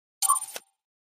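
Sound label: Electric Bounce Tap Tail, multimedia element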